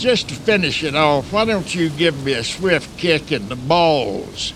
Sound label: swift kick